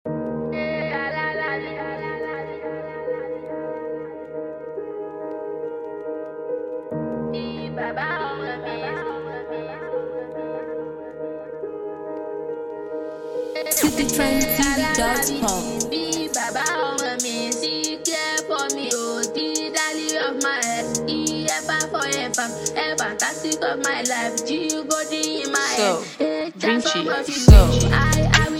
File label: Love Drill Trap